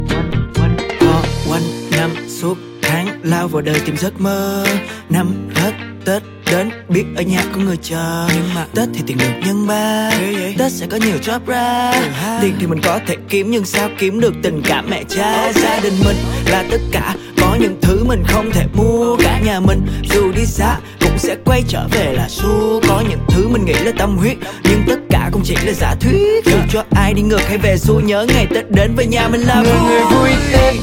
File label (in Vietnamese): Nhac Chuong Rap; nhac tre